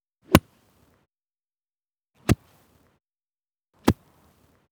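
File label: golf club swing and touch ground mono; soundscrate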